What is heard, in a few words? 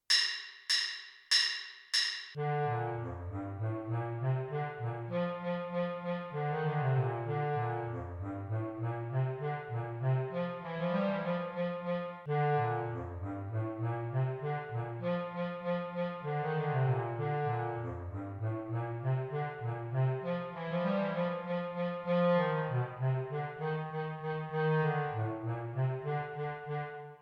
finale for bass clarinet bass clarinet only